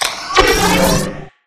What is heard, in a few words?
Cloak Activate; Titanfall 2